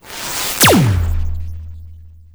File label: Laser; Feel; NiceVibrations; AHAPPresetsDemo